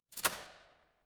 door lock

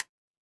ui tick

